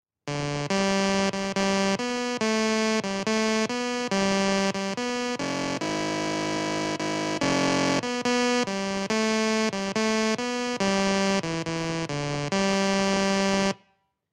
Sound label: Music auld lang syne, music on a computer; csirac; Australia